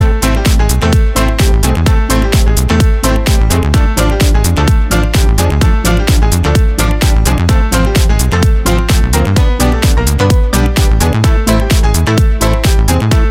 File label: pianino